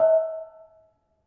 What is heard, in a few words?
Bonang Panerus, gamelan